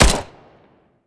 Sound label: p90 fire; weapons; covert